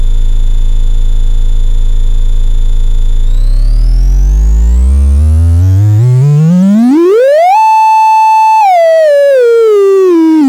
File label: SID triangle